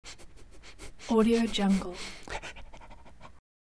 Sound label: dog sniffs; hollywoodedge